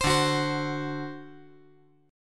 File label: D 7th, down